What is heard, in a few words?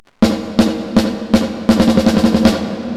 Chorus; Pitchfat